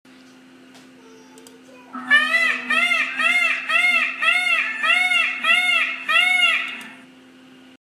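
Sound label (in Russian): pavlin golos, peacock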